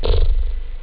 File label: TECHNO; OctaMED SoundStudio V1